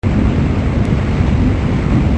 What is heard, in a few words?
Ferry Boat, machines